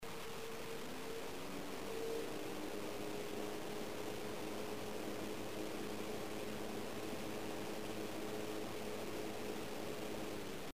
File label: silentator lo; coolink